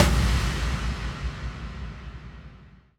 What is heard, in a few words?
Impact